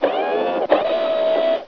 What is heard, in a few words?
gear